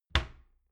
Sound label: door wood close regular